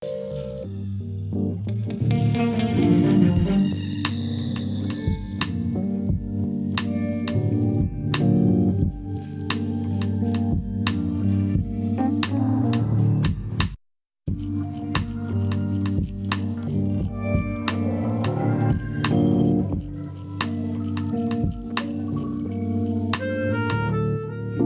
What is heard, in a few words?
old school tape